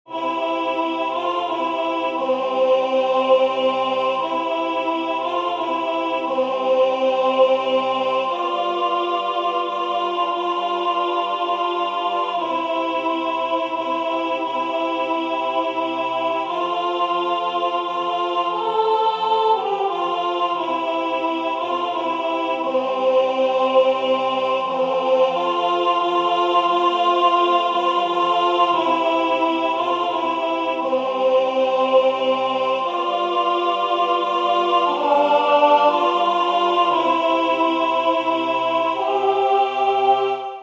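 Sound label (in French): alto, Musique sacrée; Douce nuit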